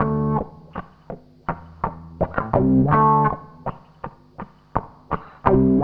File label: WAH, WAHWAHGTR